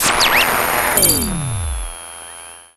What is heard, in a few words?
noise